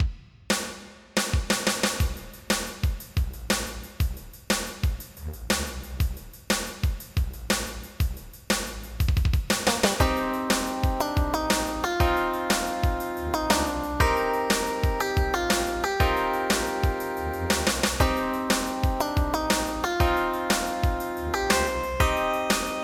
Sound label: TECHNO